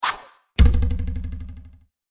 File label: Whooshing sound of a dagger being thrown with twanging sound as it sticks in the ta; Whooshing sound of a dagger being thrown with twanging sound as it sticks in the target.; Knives; Combat